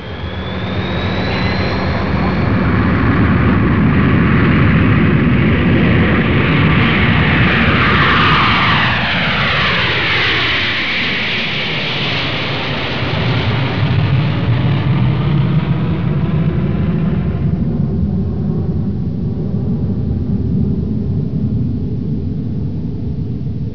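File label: 707 takeoff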